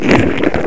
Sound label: organ